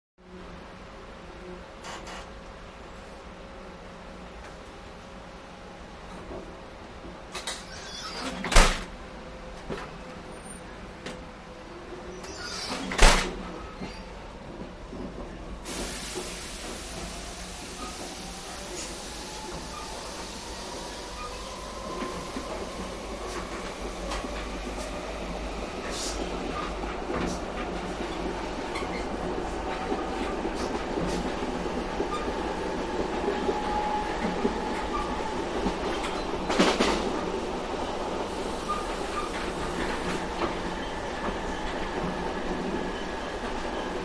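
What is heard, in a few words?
Subway Train Interior Start, New York Subway Starts And Then Stops.; Start / Away / Stop ( Interior )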